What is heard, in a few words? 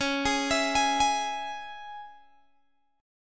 C sharp Minor, Guitar Open G